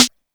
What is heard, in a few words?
Snare, Yamaha DD-20